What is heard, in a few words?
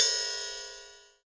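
ride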